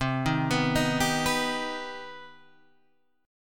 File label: C Minor Major 7th C Guitar Open G